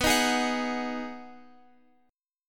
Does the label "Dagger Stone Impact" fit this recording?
No